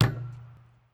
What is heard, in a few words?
Street Ball Glass Back